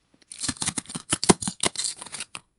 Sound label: atmosphere